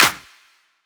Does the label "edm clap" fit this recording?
Yes